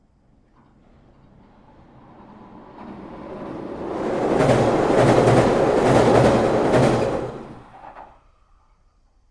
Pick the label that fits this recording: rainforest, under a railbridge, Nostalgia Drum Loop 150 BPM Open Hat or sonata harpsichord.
under a railbridge